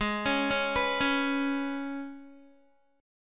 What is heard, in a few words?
G sharp Minor Double Flat 5th G sharp, Guitar; G#mbb5 arpeggiated; 15,16,13,16,13,x